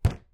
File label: wood Hit; Boxsack; twinStickCrawler